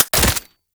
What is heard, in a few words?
AK47 Mech; Tenno